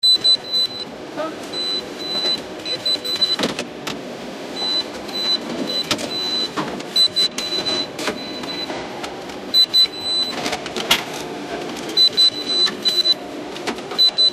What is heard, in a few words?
busy day on the tading